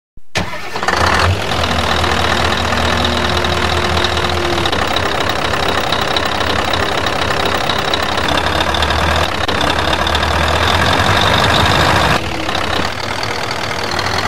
Motor Scania

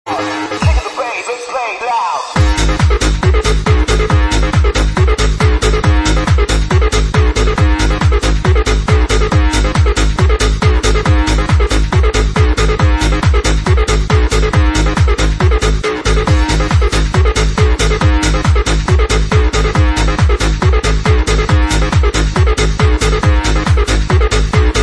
remix

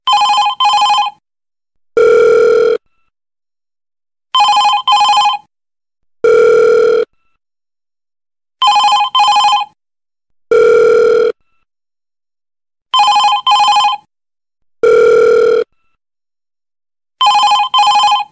Classic Cell Sound

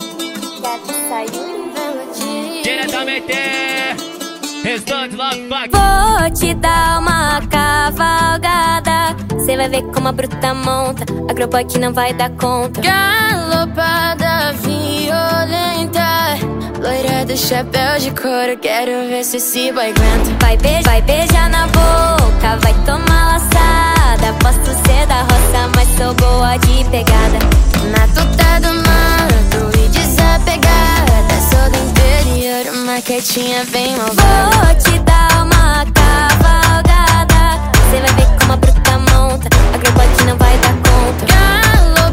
Love Funk

